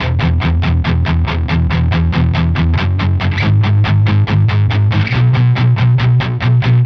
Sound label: Distorted Guitar 140BPM G#min; Vengeance - Essential Clubsounds Vol.4